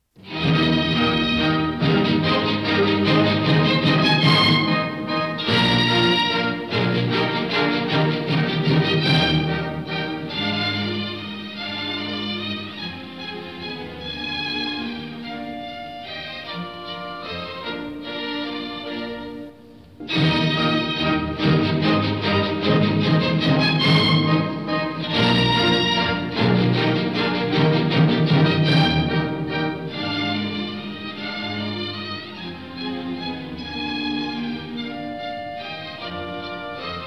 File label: Symphony No. 39 in E-Flat Major; Mozart Symphony No. 39 in E-Flat Major, K. 543 - 3. Men